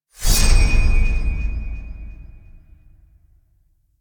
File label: UI Tutorial Step Complete; Duviri; Cave Intro